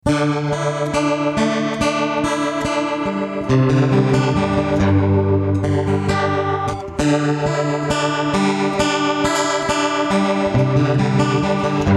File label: Preset Rotary